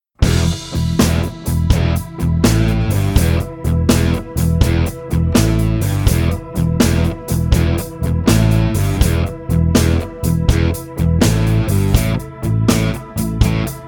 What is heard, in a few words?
shuffle blues in e